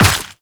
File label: Run Dirt, Footsteps